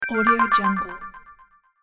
interface reveal arp plucks down delay; interface sounds

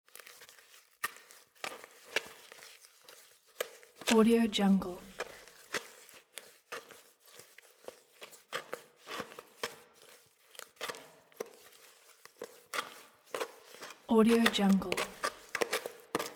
mixing plaster with spatula in small bucket; domestic sounds

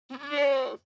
animal goat baa shy male; بز; nature sounds; صدای بز

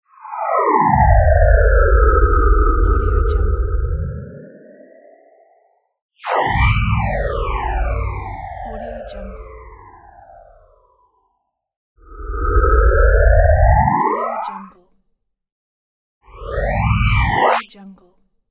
infographic transition; transitions and movement

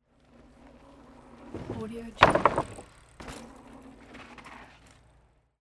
bicycle ride through platform jump and brake; urban sounds

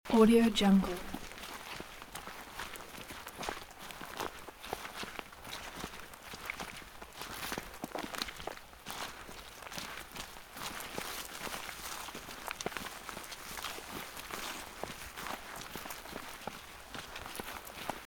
group of people walking in rainy forest loop

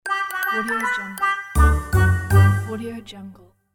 cute kids musical conclusion; cartoon

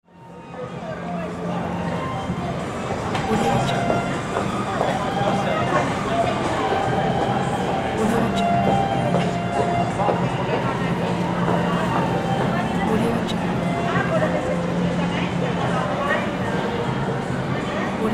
city outdoor market

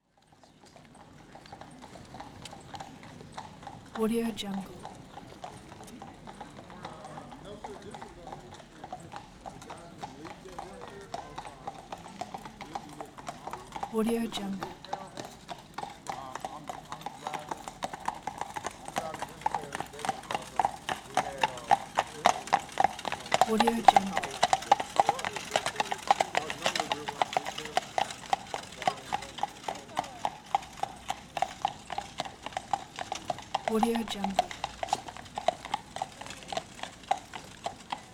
horse drawn carriage passing with people; nature sounds

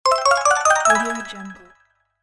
completed level; game

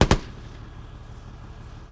military tanks machine gun machine gun tank x2; game; شلیک دو گلوله از مسلسل

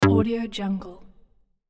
interface denial beep short; interface sounds

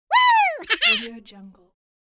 cartoon voice happy woo ha ha

